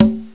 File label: Conga L; TG300B; Drums; GMPlay